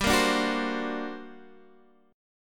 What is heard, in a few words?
G Minor Major 11th, G, Guitar, Half Step Down